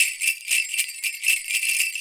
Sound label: 120, MIXED DRUM LOOPS 120; World Instruments 2; WORLD DRUMS